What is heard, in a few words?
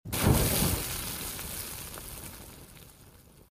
barrier; The Witcher Hunt